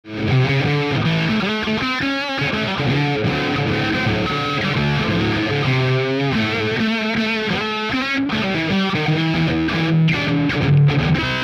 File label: USA tele jam